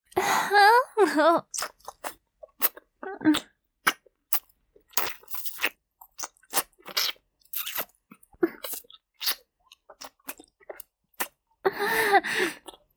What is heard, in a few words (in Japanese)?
フェラチオ(中):亀頭をしゃぶる; 実用ボイス抜粋集